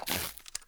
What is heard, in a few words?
m60 cardboard rip; mg; garrysmod